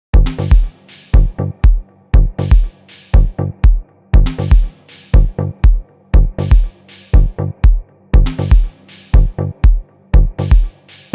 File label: Drums Of Africa